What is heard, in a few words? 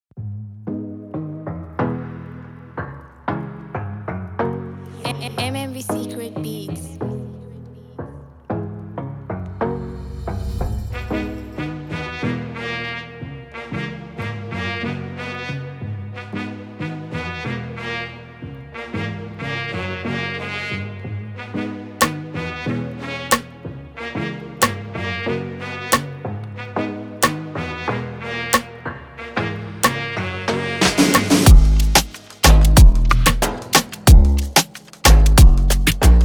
Latin Dancehall